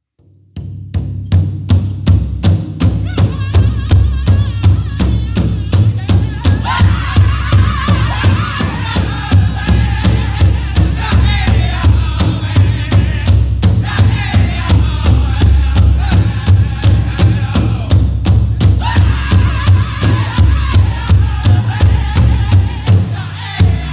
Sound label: Jingle